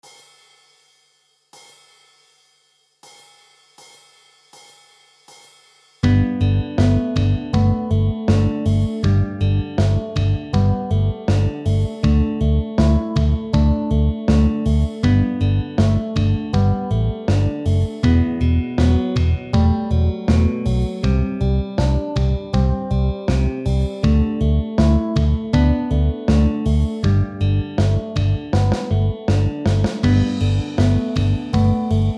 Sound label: folk song, guitar